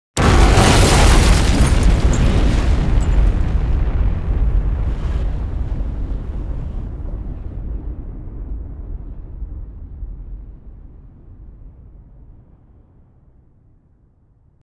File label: Explosions - Crash